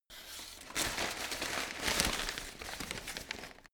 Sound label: paper rustle